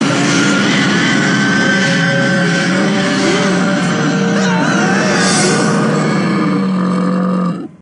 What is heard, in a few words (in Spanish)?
El Gusano Gigante; Godzilla The Series